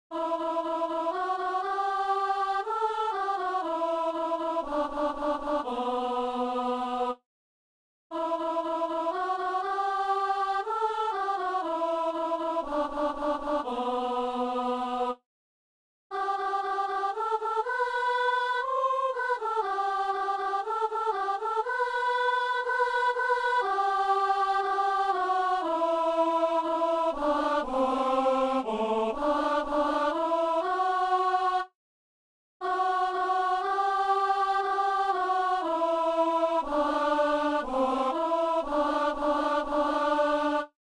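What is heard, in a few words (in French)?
fais paraître ton jour, alto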